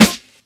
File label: Snare